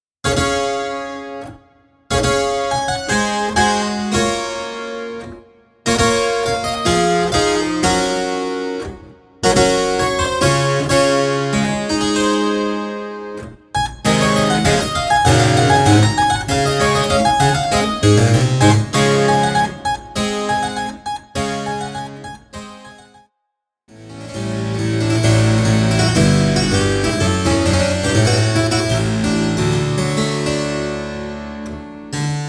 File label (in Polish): Klawesyn; szkoła II stopnia